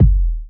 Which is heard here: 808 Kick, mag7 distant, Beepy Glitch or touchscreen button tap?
808 Kick